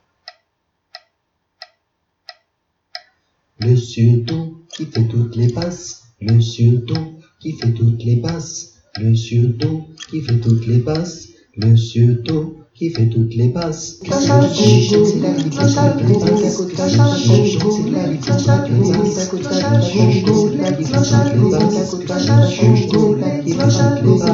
Batucada vocale surdo

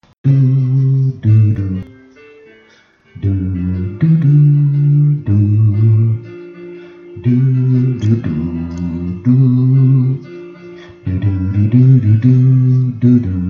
basse VOIX

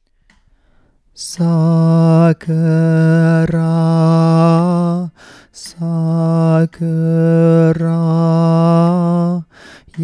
alto VOIX

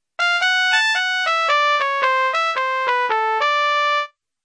Trompette solo

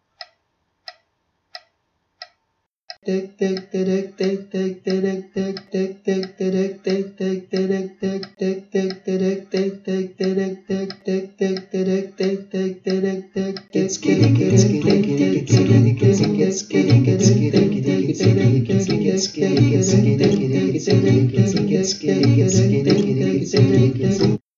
Batucada vocale tamborim

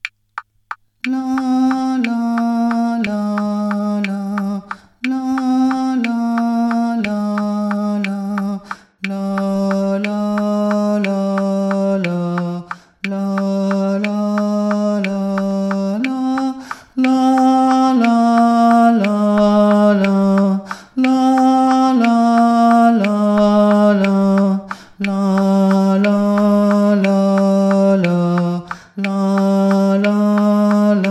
baryton VOIX + clic; air traditionnel mexicain / (Tehuantepec); homme; La sandunga

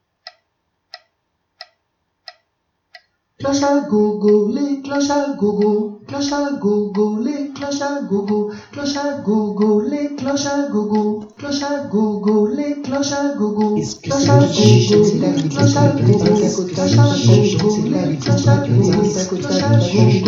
Batucada vocale; agogo

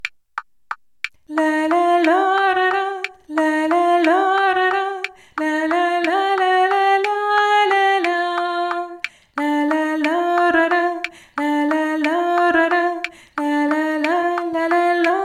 soprano VOIX + clic; air traditionnel mexicain / (Tehuantepec); La sandunga

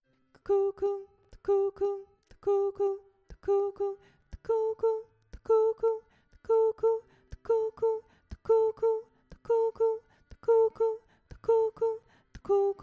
soprano VOIX

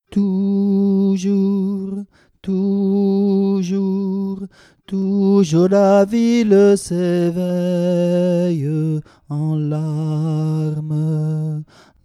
Soprano VOIX

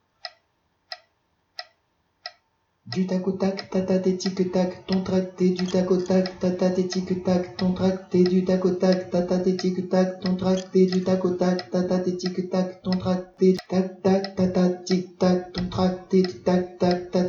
Batucada vocale; tamborim

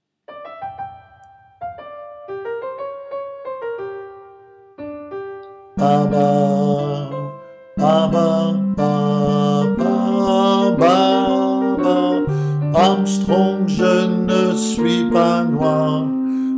alto VOIX

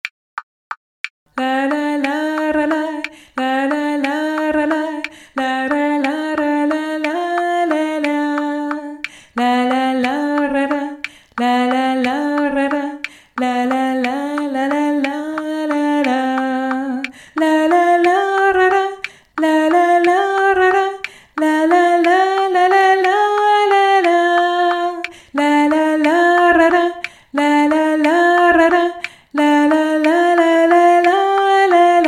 alto VOIX + clic; air traditionnel mexicain / (Tehuantepec); La sandunga

